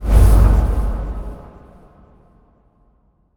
shadow teleport; yami

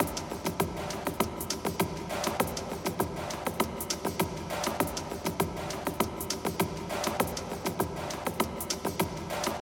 Drum Layer

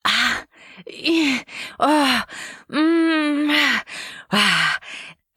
Moan REV; Anna

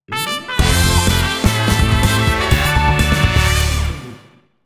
Win Game; Monopoly; PSP gamerip; Classic Worldwide

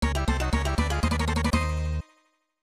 Alert; Harapeko Bakka; SNES

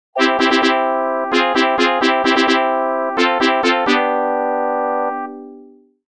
fanfaare; Marchen Veil

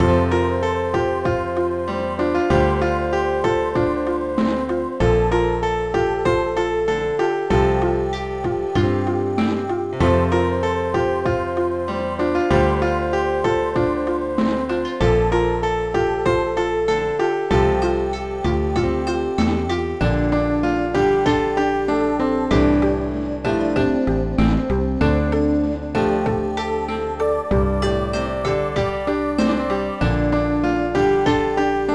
BGM COMMON; Izuna Legend of the Unemployed Ninja; Nintendo DS gamerip

